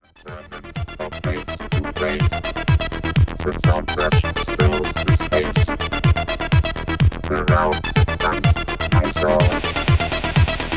Suono Elettrico

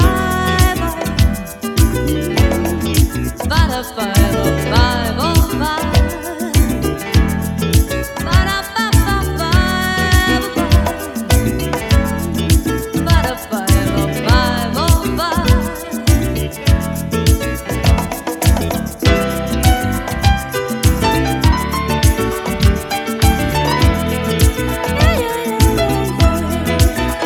Marimba Fusion Version

